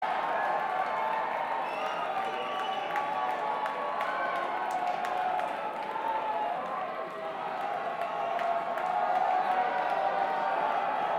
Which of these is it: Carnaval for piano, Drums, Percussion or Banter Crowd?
Banter Crowd